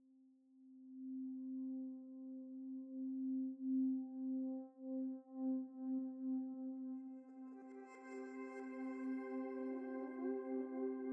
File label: Main Reverb & Delay; risk of rain 2 engineer edition 2